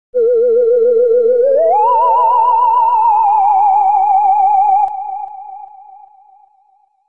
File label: Horror Spooky Tone